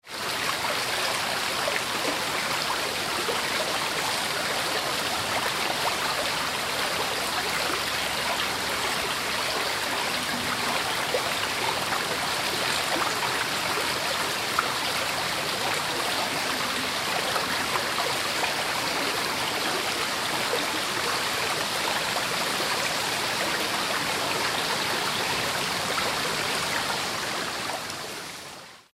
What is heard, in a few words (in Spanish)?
Ambiente de río